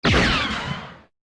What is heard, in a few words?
blaster dl443, Guns Fire